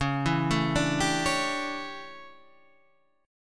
D Major 7th Suspended 2nd Suspended 4th C sharp, chord